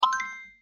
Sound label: correct; QUARKSTARS